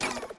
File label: Puzzle Reset